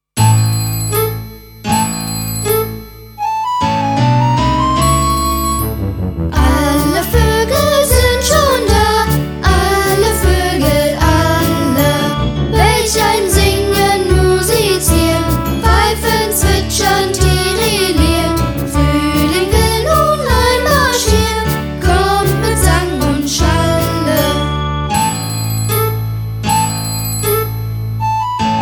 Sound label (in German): Alle Voegel sind schon da